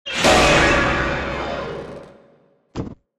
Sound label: drop piano